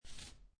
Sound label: Poker Chips Slide One Chip On Felt; Gambling; Casinos